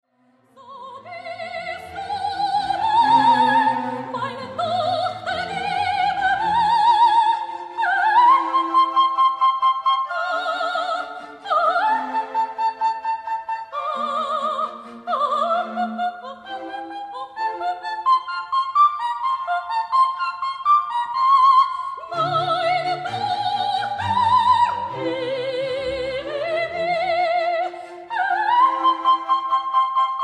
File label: female soprano queen of the night; the magic flute